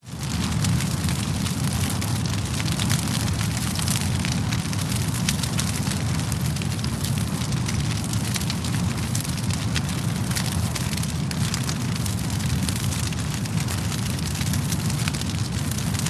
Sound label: Fire; audio hero